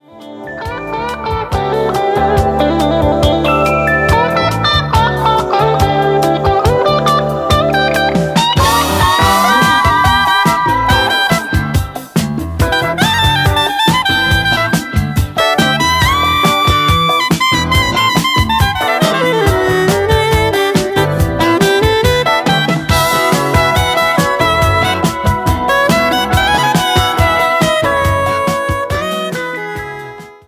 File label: fusion